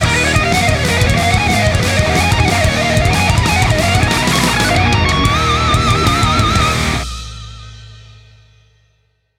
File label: Hammer Sting; Thor's Hammer; FueraDeEscala; Game Kit Controller